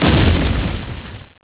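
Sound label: small explosion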